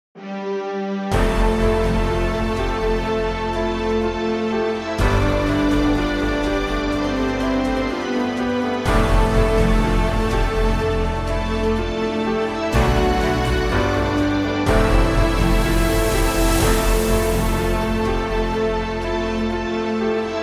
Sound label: GAME RINGTONE, INSTRUMENTAL; AVENGERS X PUBG